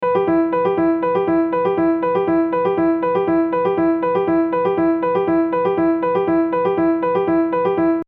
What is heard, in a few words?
Arp Down